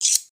blade

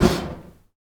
Fire Staff, Fire sound effects

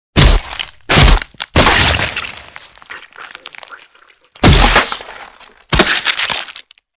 crack, Medieval Weapons Sound Effects

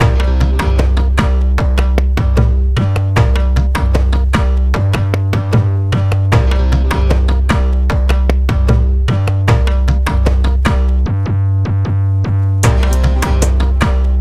Riddim

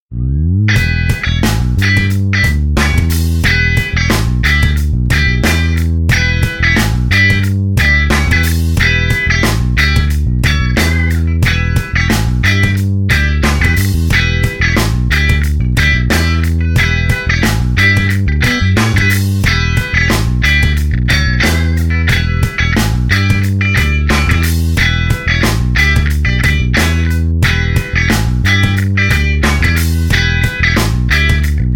Funk

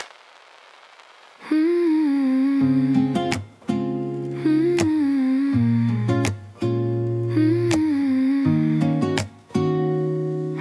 Bells in the City, Jazz

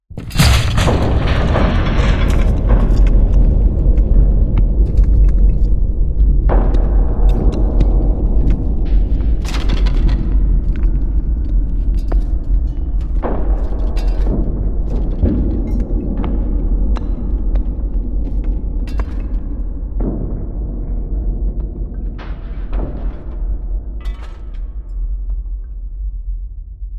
nuclear explosion

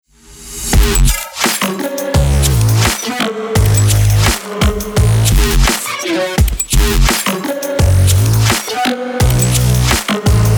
trap powerful intro

